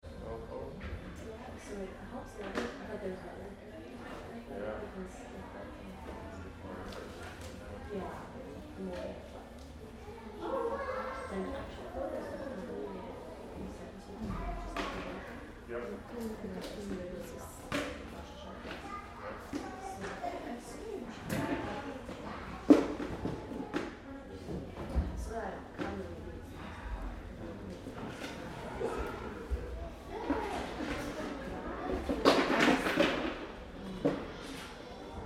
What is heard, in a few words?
Crowd Kids Gymnastics Lite Toys Teacher; Miscellaneous Sport